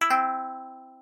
balloon Drop D; balloons-and-static-electricity

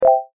Compound w Low Pass Filter; phet io wrapper sonification; molarity

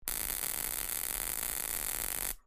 Electricity; john-travoltage

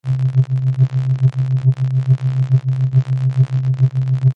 Current Loop; ohms-law

